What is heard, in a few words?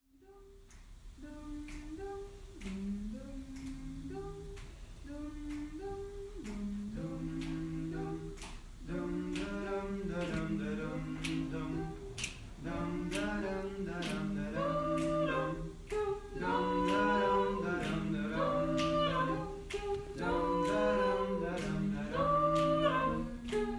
Trio Vocal